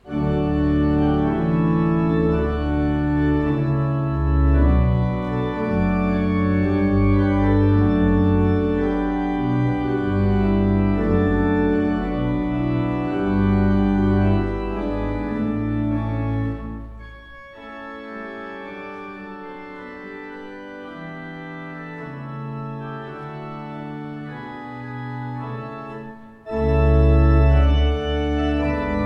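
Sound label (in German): Orgel, pfeifenorgeln; Conacher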